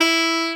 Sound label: A SAX POR E3, Alto Sax Portato; Orchestral; VarazdinOrchestral